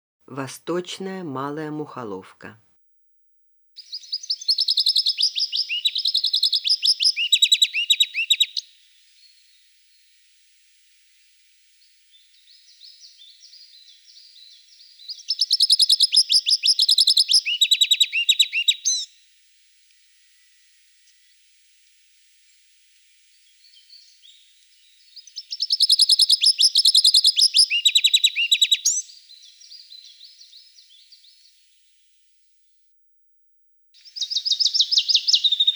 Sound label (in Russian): malaya muholovka golos